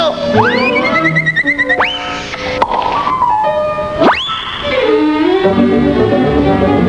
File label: cartoon